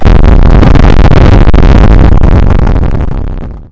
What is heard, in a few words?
Big Explosion; Space-Smash-Out; SoundManager Demo